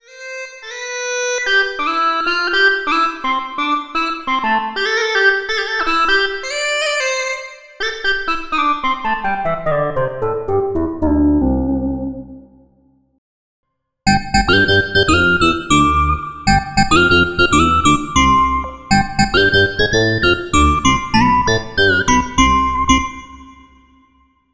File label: FM Horn Group